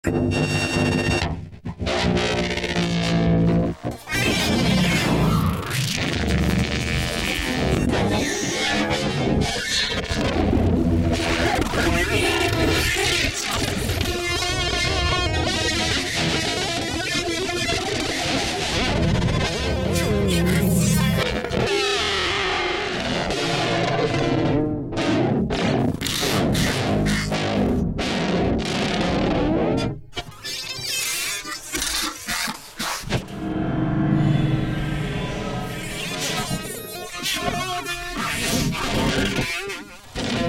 Guitar Solo

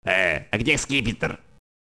ahh!

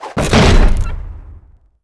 fire; AoM-ARTofMyth

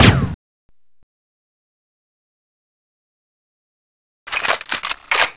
m203 fire reload

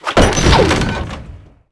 fire; AoM-ARTofMyth

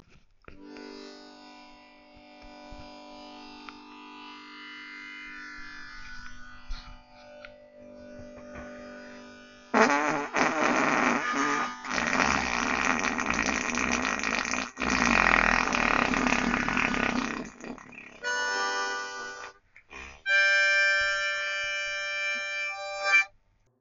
Harmonica

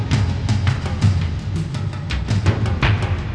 Myth drums; AoM; ART of Myth

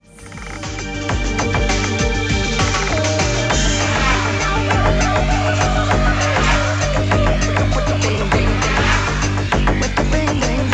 intro music

